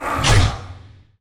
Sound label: attack; RaisedGladiator